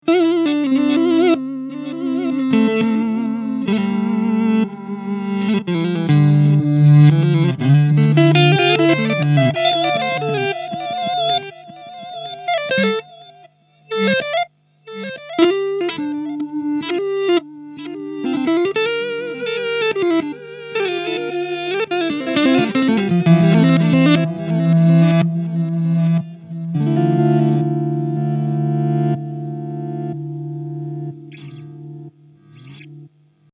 hx reverse delay

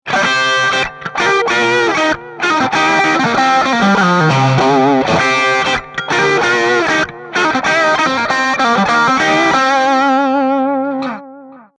Licks Bridge Single

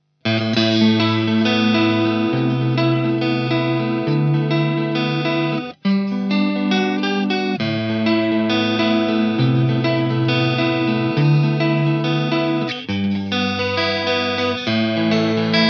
hx flanger

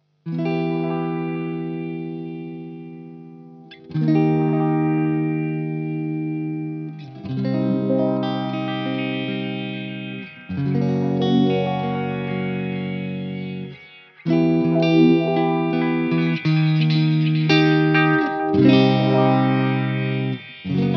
hx filter delay

